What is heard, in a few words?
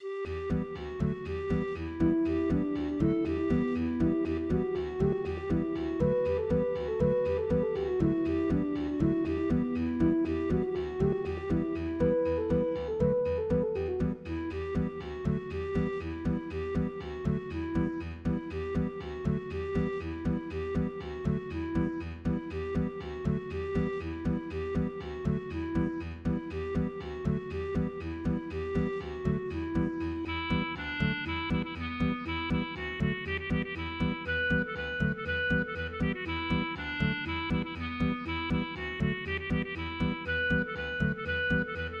Soul Cake, For Keyboard